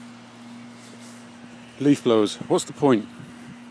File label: leaf blowers